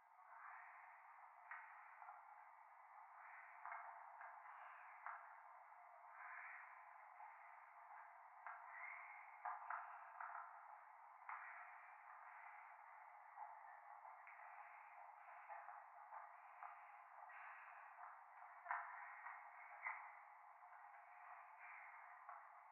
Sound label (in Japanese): 教会 右耳密着吐息 電車